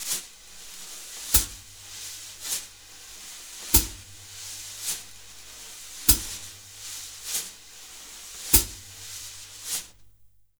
BALLAD, Jazz Latin Drumloops; USB Soundscan vol.08; AKAI

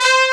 brass, sound canvas

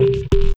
Machina, Synchro Funk, Beats; Sonic Foundry (Sony Creative Software)

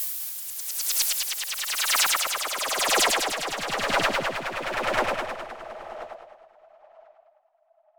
Sweep; noise

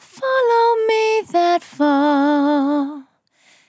On A Star 65bpm F, Vocal; Zero-G

